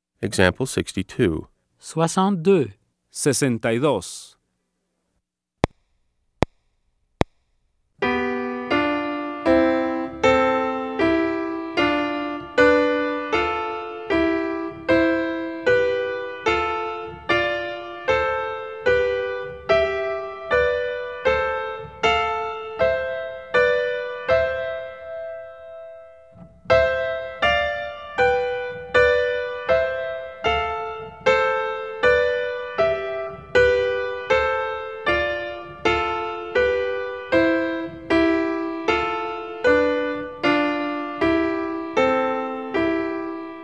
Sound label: Keyboard